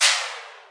Noizhit TD, Roland TD 7; DRUM MACHINES

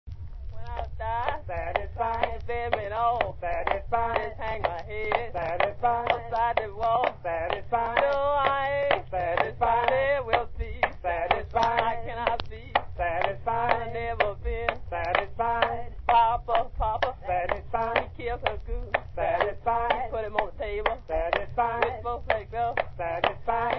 clapping song; lomax

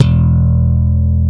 slap E1, Hard Slap Bass

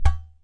udu POP, Udu Clay Hand Drum; ETHNIC and WORLD PERCUSSION

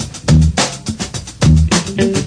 hats snares and teensy guitar, 105 bpm, drum loop; 101 to 110 bpm

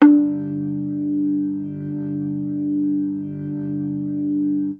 hard attack, Organs

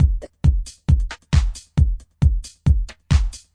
135 bpm, mostly just kicks, drum loops and breaks

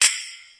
hard sleigh; Western and Latin Percussion; single hits

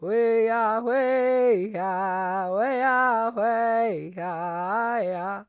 native vox, Native American; sheshone

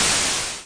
explosion, 8 Bit Videogame; LO FI and 8 BIT KITS

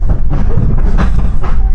137 AM groove, DRUM LOOPS and BREAKS; 131 to 140 bpm; samples-ghost

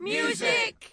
music vox, FEMALE SINGING

